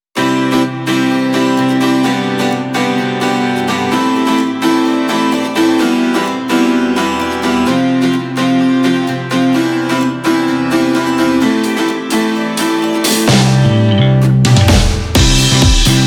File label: BPM128; ポジティブ思考音楽